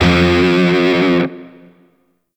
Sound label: Shawzin Flourish Oct One F; Warframe; Grineer Shawzin